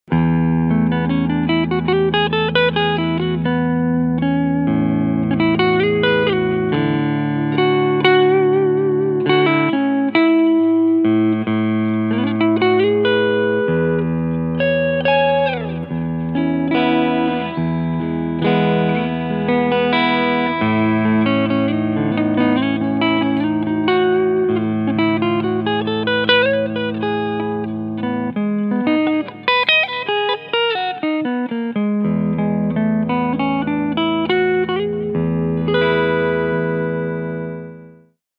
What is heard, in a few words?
AZS2209H Cleansound Neck Pickup; Ibanez